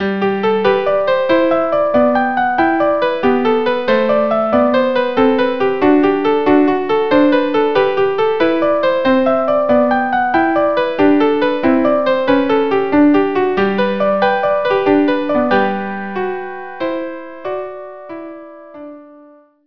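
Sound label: jesu joy violin violin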